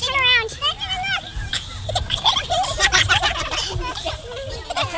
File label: baby, BABIES; East West; Global Sounds